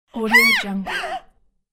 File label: cartoon manga girl scream